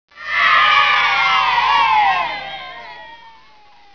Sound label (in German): jubelnde kids